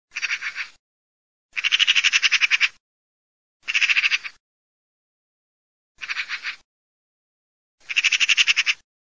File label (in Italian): gazze